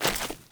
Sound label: Default Step; Characters; Steps; Sprout Farmers